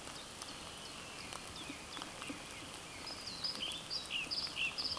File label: Ficedula hypoleuca